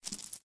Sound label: coin pick up; the 39 steps